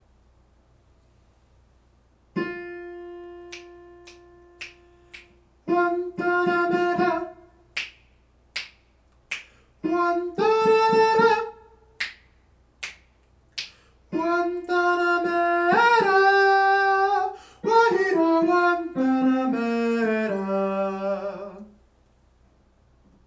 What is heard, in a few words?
tutti alto